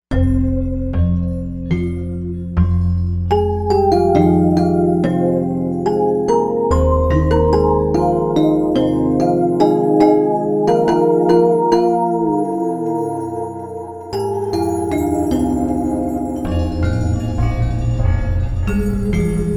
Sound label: Rattling Chimes